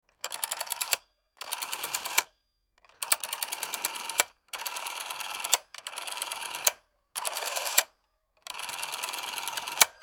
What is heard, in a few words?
old rotary phone